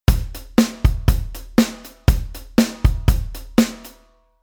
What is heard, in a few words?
Rhythm